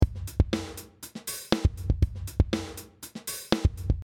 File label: drum EQ comp